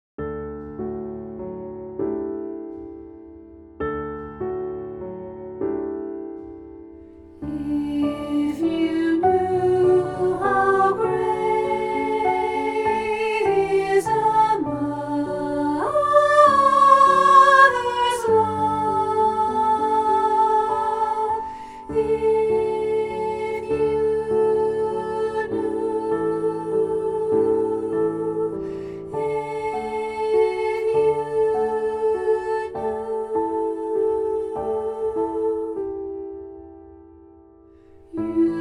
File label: Soprano